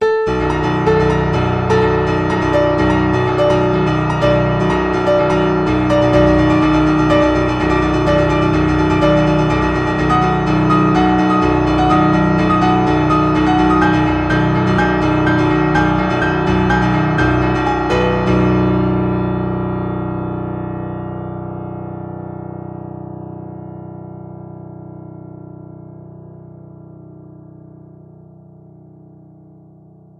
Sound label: poly Rhythm Tone Row